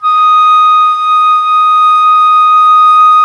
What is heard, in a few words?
FLU, Flute; Sound & Vision - Gigapack I CD 2 (Roland); Brass&Woods2